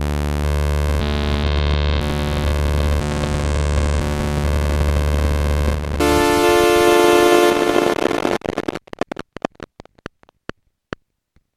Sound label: MODOR NF 1 Dely FB